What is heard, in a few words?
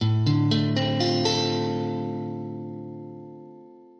D min7